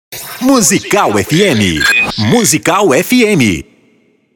ponte musical

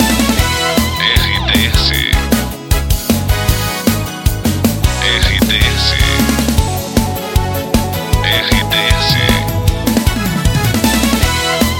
trilha para locução; Pacote Princesa FM, Rodeio/SC. Estação com características popular

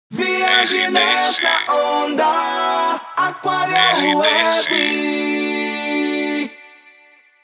ACAPELA; Aquaria Web

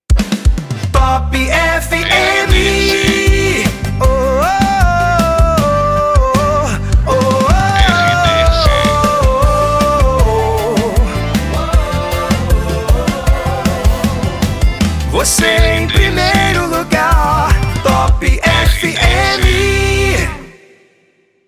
saída de bloco; Top FM

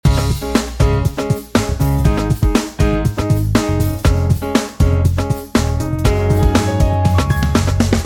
trilha para locução; Prata FM 2021

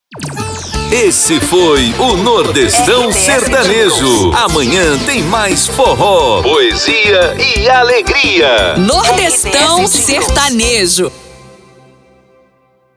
encerramento sertanejo; NORDESTÃO

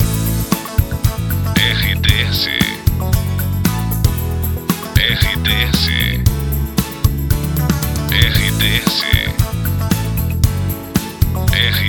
TRILHA PARA LOCUCAO WEB NET POPULAR

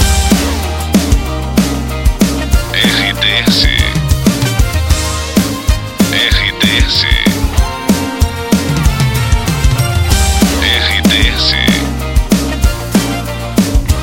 trilha para locução; Pacote Praia FM, Bertioga/São Paulo. Estação com características popular

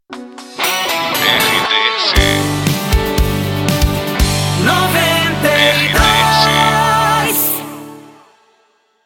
SB CURTA FM; 92 FM

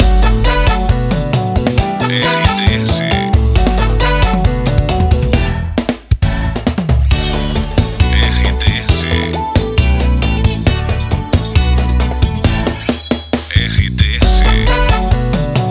TRILHA PARA LOCUCAO BOTA PAGODÃO

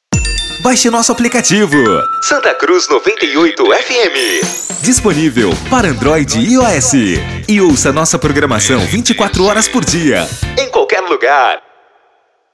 intercom aplicativo

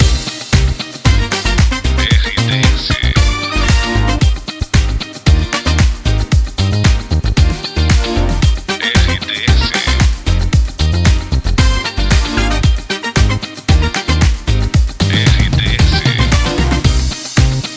trilha locução news; Pacote 012 News, de São José dos Campos/SP Estação com característica All News

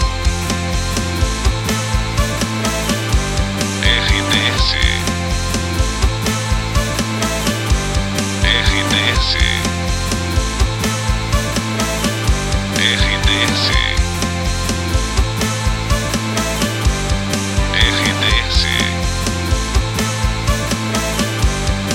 TRILHA PARA LOCUCAO WEB NET JOVEM

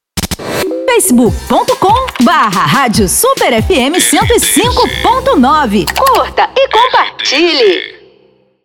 intercom

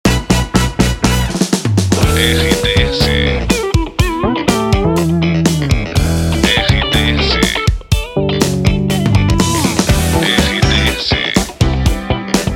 trilha para locução educativa; Inatel; Difusora FM, Nortelândia/MT

